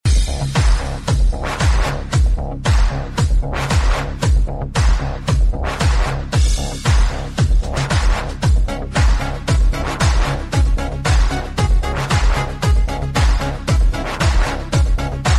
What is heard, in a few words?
Circus Dance